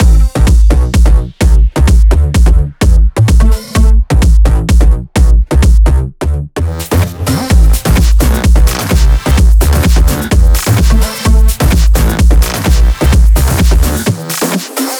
Mix, 128BPM; Vengeance Samples; Breaker Kit

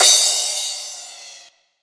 Crash, Cymbals; Vengeance Samples

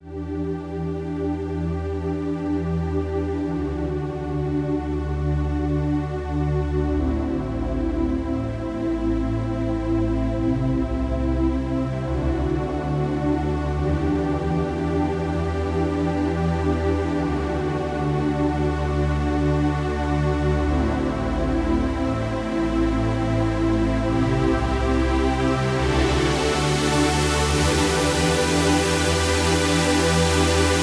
140BPM Pad Full, Eminor; Vengeance Samples; Selection Kit

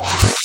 reverse, FX; Vengeance Samples

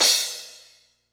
Cymbals crash; Vengeance Samples

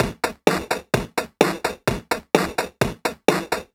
House Beat, 128 BPM

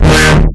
FX Scratching; Vengeance Samples

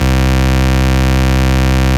Basses Root C; Vengeance Samples

